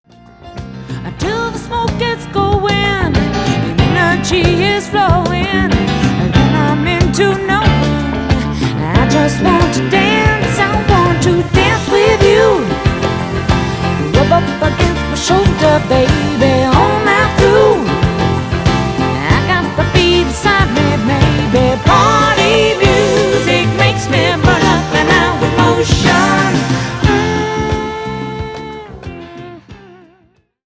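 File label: idol female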